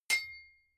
hammer hit ring high

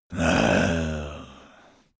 zombie moan idle heavy

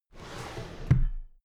drawer cabinet paper close regular halfway

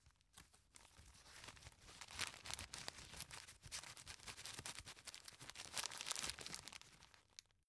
tissue stuff in package

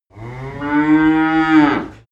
cows moo barn

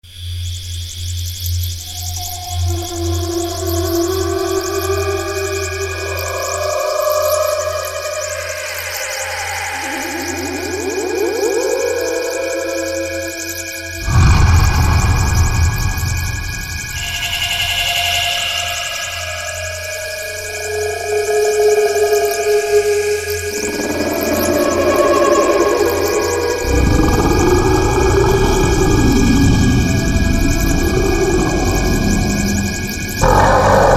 space jungle full mix

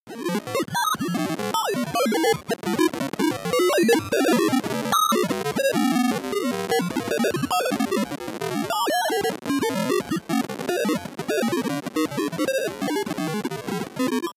broken computer random long

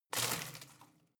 home plant leaves rustle heavy short

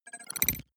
data transfer pluck

